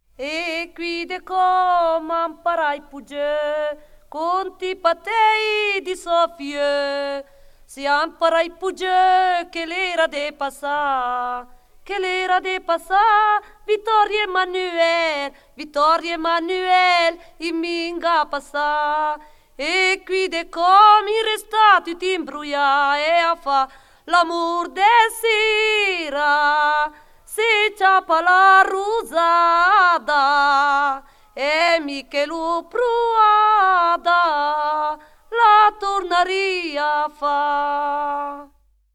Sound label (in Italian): e qui de Com; BRIANZA PRIMA PARTE - CANTE, CONTE, CORI, FILASTROCCHE E BANDE DI FIRLINFEU